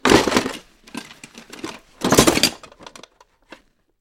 podgotovka amunicii k boevim dejstviyam

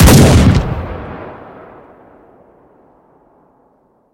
gromkij derzkij vistrel iz pushki

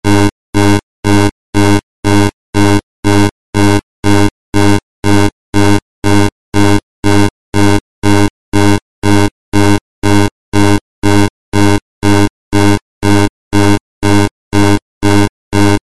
elektronnaya signalizaciya sintezirovannij zvuk

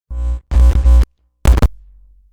elektro-staticheskij shum

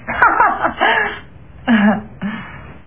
smeh zhenskij gromkij

